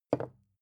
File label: glass put down heavy fast; objekte